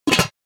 Cooking pot lid close; utensil

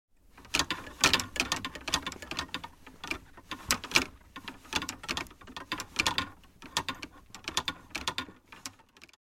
Shaking of tour bag golf clubs movement

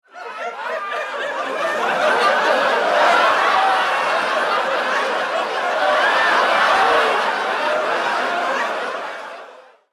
Audience laughing loudly; Crowd

